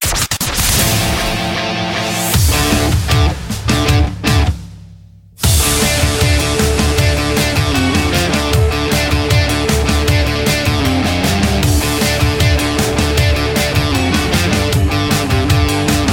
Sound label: Bed Rock